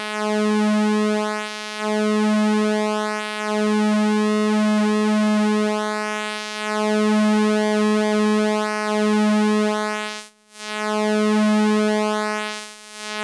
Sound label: Pulse width modulation